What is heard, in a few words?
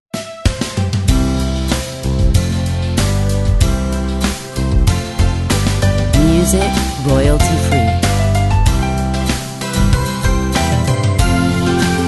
Upbeat Happy